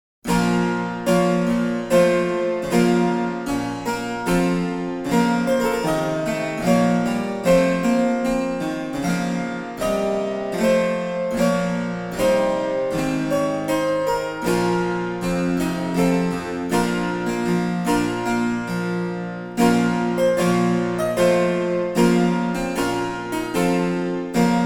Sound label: German Harpsichord 2x8; German Harpsichord 1741; Excerpt from The Galliarde to the Firste Pavian by William Byrd; edition organeum